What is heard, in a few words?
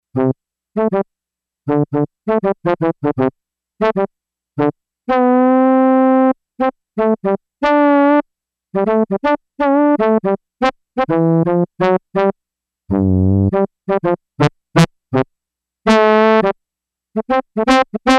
Trombone